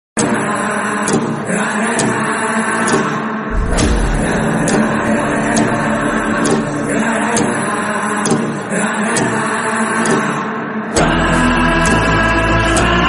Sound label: mass bgm villain bgm telugu ringtones; Krack